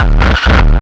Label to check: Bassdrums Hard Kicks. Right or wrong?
Right